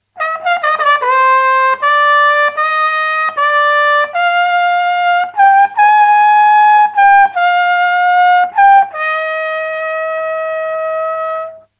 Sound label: Trumpet